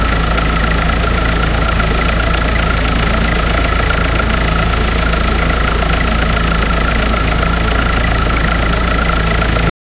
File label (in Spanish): motor a gasolina 6 cilind